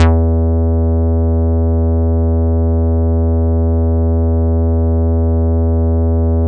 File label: bass, synths